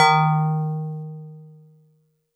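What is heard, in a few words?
StarPiano DX100 D#0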